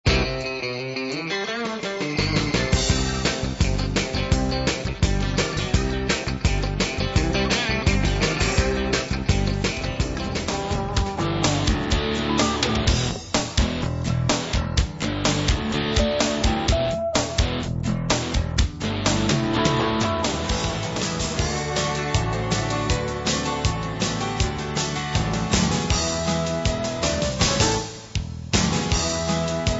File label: country rock